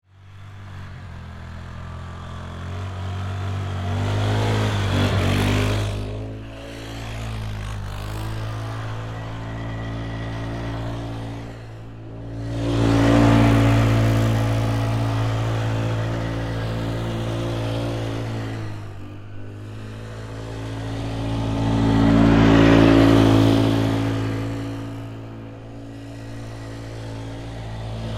ATV Activity, Sports, Atv, Activity, Polaris, 500Cc, Four, Wheeler; ATVs; Blast Wave